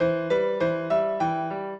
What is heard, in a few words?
piano; Mozart